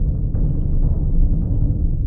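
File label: Fanfares, timpani roll loop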